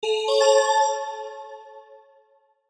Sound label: CHIME MELODY; Chimes; Multimedia; Blastwave